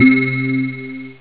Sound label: bell; xfce